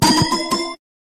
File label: glass cannon stack gain; killsounds; Balatro